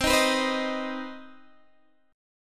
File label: C Minor Major 7th C Guitar DADGAD